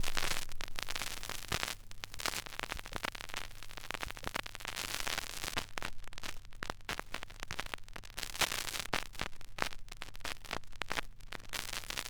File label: crackles, Vinyl